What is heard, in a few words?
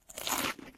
eating